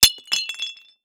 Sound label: Impact Ceramic Cup Hits Concrete